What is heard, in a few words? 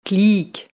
clic